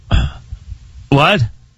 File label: Sigh WHAT Loud; WFAN